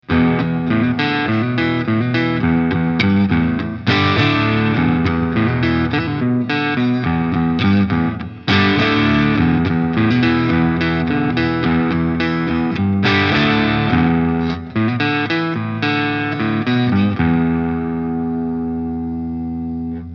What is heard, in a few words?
Hals bluesy riff